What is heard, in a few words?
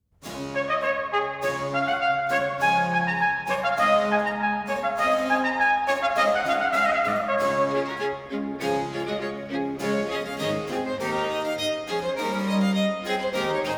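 Sonata for trumpet strings & basso continuo in D major - (Allegro); Purcell; Complete Chamber Music; Brilliant Classics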